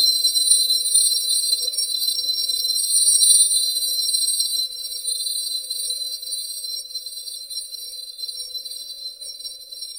soft sparkling chime; airy

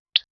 french kiss on mouth, romance

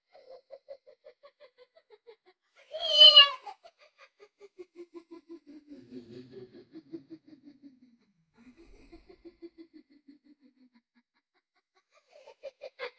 demon girl evilly laughs, horror

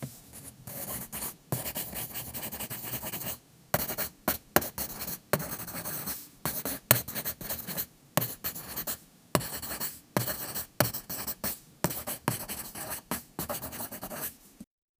pencil drawing on canvas

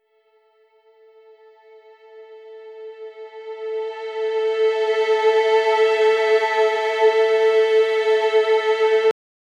light strings soft violin

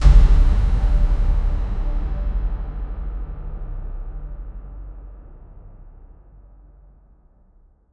epic brass hit with rever

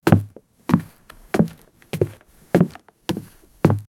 footstep sounds on a wood; shoes

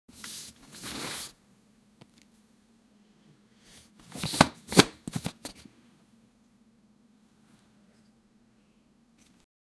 asmr slowly opening iphon; air suction

